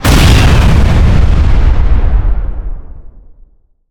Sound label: explosion